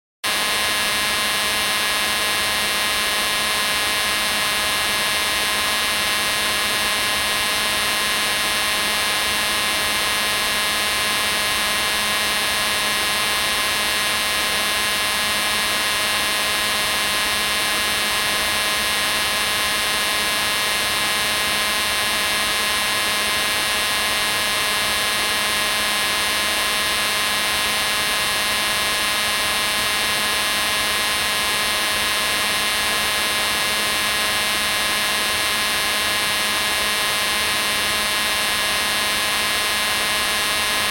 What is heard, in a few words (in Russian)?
sintezator casio zhuzhzhashij shum